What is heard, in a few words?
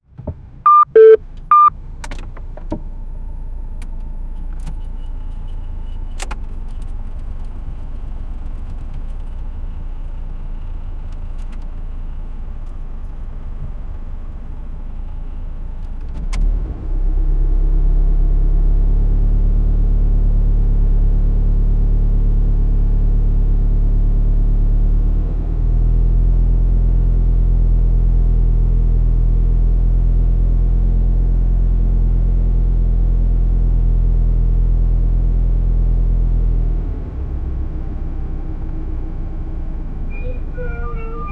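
onboard toyota avensis, engine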